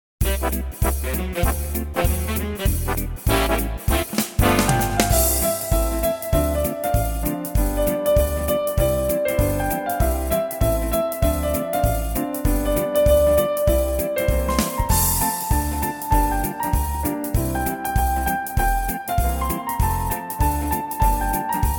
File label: quickstep